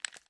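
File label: pistol inspect xmag movement; reloads; gmod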